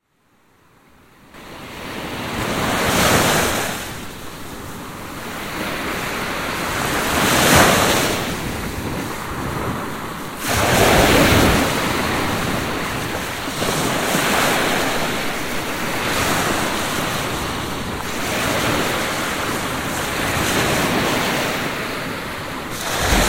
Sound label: Sleep with sounds of ocean